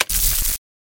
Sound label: generator button on mono